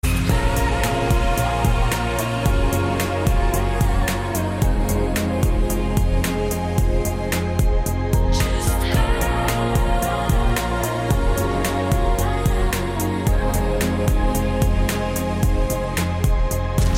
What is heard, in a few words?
Electronic Melody